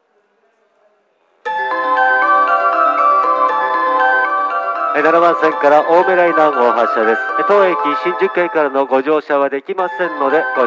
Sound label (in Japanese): shinjuku hassya; 発車放送; 新宿